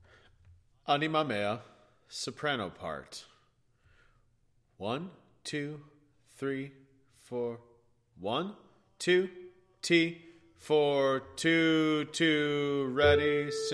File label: Soprano